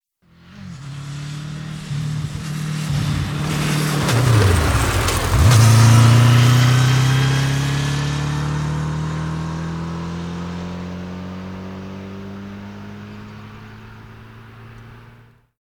Motorbike Suzuki 750 Up and Slow Past; Motorcycle; Tom Hutchings

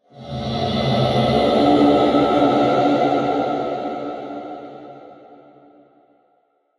Sci-fi Monster Laser Lizard, alien; Sf Monster; Alien Sound Effects; Science Fiction Sound Effect

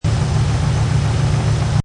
Truck 4.7 Liter Idle Loop Engine Perspective; Auto, truck, motor loop. 4.7 Liter Truck / Lorry. Seamlessly looping sound of engine idling. Engine perspective; Car

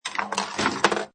Pinball Sound Ball Move And Hit Metal Objects; Video Game Sound Effects

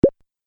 Idea Single Pop, cartoon

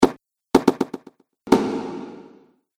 Impacts Dry Delay Reverb; Hit Sound Effects

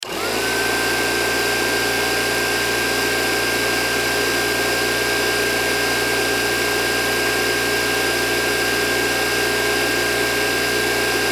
Air Pump Electric On Run Off; Air Pumps; Machines; Industry; Blastwave

